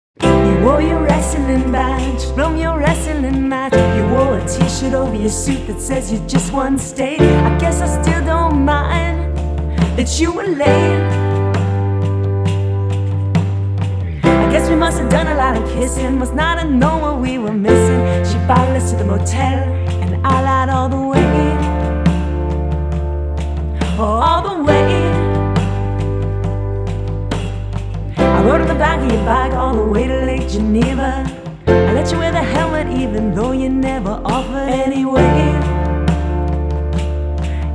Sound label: acoustic